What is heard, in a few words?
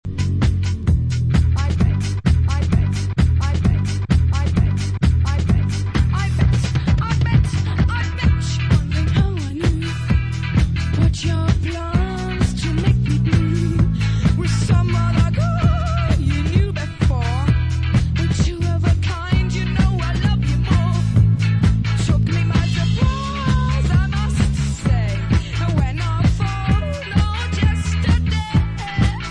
bass vine